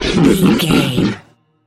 troll monster laugh

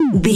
retro ui menu short beep

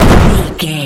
explosion small no tail

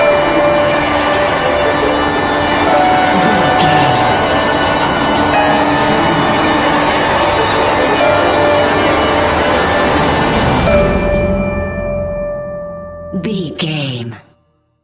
modern horror; Video Game

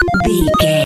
retro beeps collect item

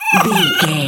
goblin fairy hurt pain choke

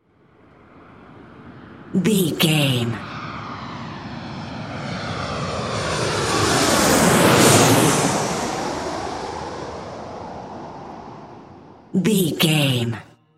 airplane passby

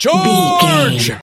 voice male battle shout charge

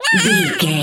voice fun ant creature

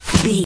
bullet impact snow

